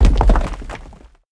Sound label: reel Bounce background; parthenon